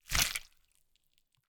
gore - flesh guts gore impact blood squirt wet - medium; Timothy McHugh; Gorification [HD]